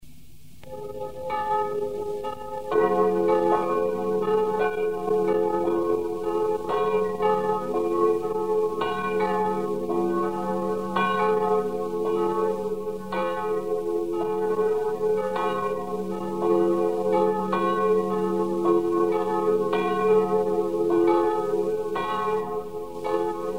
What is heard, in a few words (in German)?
glocken